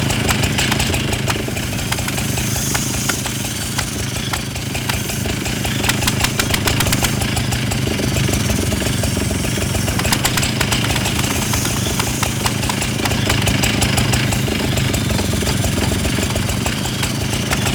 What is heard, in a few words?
Chain Saw Tonfa Idle Loop; Warframe; Grineer